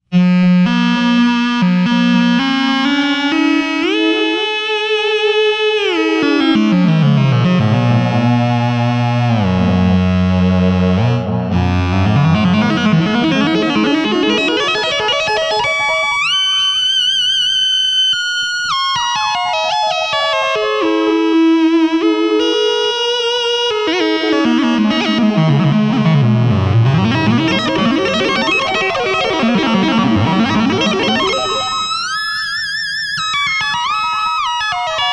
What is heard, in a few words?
Korg Poly 61; EQD